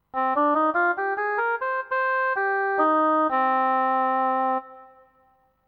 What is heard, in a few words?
oboe short